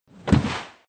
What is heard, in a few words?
Transition Out; scoobysnackmachine